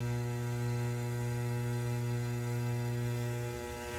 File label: continuous low pitched electrical buzz